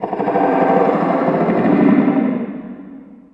inside battle antlion, prison; Half Life Model Pack; Half Life 2; ambient levels

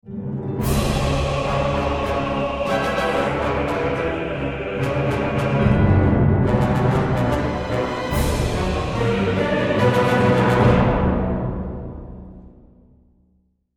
round ten sec count, music

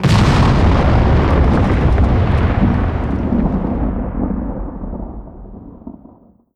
land rumble; creatures; combat; strider; seq death

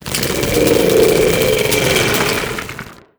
getup short, zombie revived

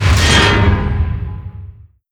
smokestack start flavor; destruction; Half Life Alyx

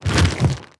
step movement, zombie blind, foley; creatures

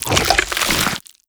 open slow, zombie blind jaws; Half Life Alyx; creatures

